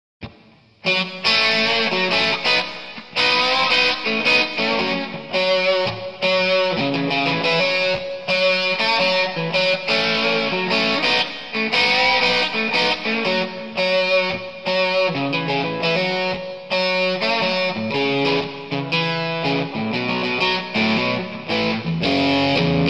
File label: bridge od, telecaster; MudCat; bluesbraker